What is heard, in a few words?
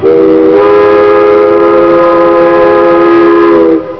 chime